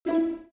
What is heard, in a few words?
dial tone orchestra; Oppo R7